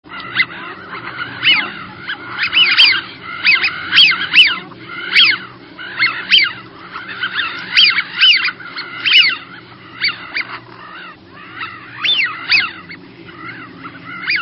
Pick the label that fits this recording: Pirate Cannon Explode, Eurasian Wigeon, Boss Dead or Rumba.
Eurasian Wigeon